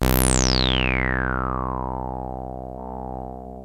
SOFT REZ 303, ROLAND TB-303 BASS; Rubber Chicken Software Sample Bank

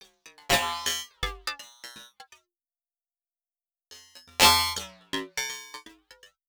Drum Fx Loop, Electronica

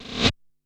RM1X Drum Anlg Kit Reverse Roll; Yamaha RM1X

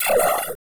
ZZWOSH, STRANGEFX; Total Control - Action Cutz

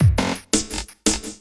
LOOP, Drum & Bass, 170 BPM

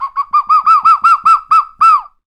PRC Bird Calls; NorthStar - Global Instruments VOL-2

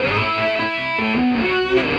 Electric Guitar; Sonic Foundry (Sony Creative Software) - Friendly Fire; Serpent

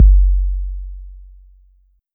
Kick, Roland TR-808; Roland MV8800; TR-808mk2

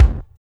KICK; Funky Ass Loops; East West

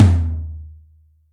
Room Lo, Acoustic Tom

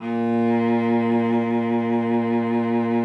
A#2, cello vib; Xsample VOL-7

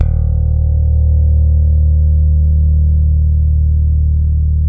PRECISION BS E 2, Bass; Spectrasonics; Bass Legends

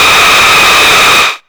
TRASH WIND, Hard & Loud Techno; USB Soundscan vol.01; AKAI

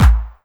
KIT, Dance Mega Drums; Best Service

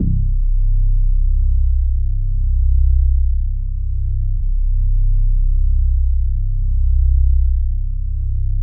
Synth Basses C1; Music Store Sound Service; Einstein

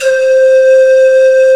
brass, synthesizers; VFX-SD

